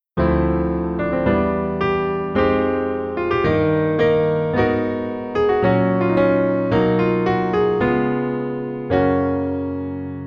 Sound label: transposition and modulation